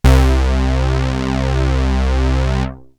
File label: ANALOG, BASS; Best Service; Hyper Dance